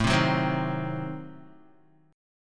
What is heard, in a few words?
A Diminished 7th A down Guitar; Adim7 strummed; JGuitar